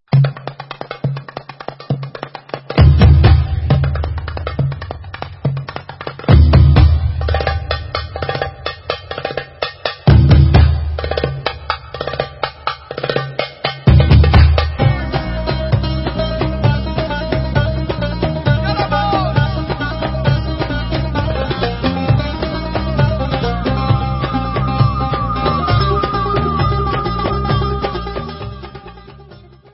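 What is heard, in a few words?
Instrumental, Israeli Dances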